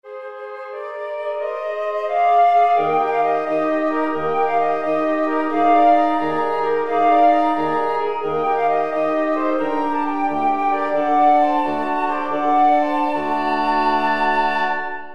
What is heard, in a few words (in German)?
blaeserquintett